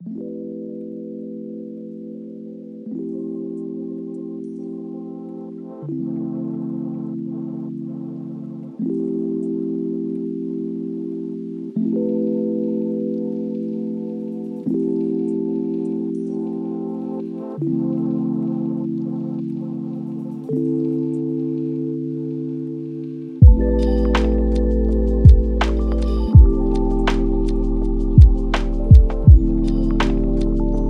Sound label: lofi hip hop